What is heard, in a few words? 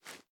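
assault rifle reload arm; viper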